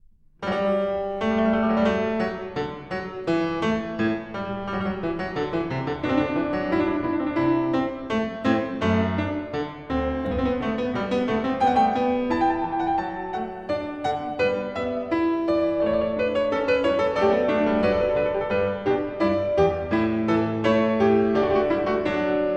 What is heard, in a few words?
Bach Goldberg Variations BWV988 Variation 10 - Fughetta (Un Poco Animato)